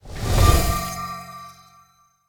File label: buildup; Smite; god Khepri